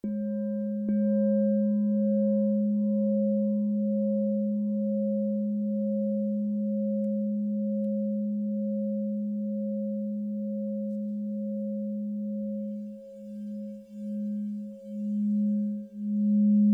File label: Crystal Tones Singing Bowls; Crystal Tones Alchemy Singing Bowls